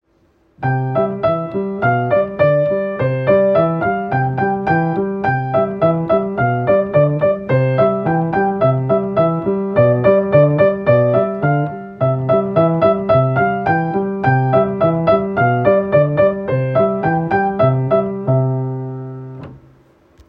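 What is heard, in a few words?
Lightly row, Piano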